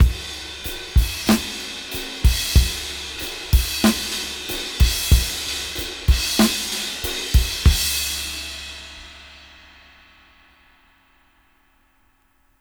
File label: Half Time Slow 94 BPM